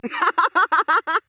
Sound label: Laugh